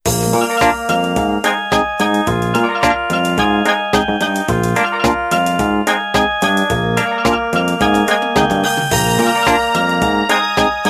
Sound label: Nokia 8.1 Plus, tono de llamada